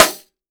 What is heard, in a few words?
jungle snare chromatic, real drums; ProSamples vol.43 - Real Drum Kits